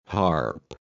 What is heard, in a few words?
Harp